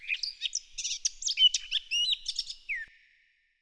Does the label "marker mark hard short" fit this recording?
No